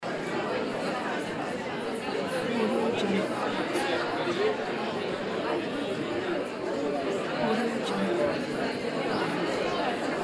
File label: crowd murmuring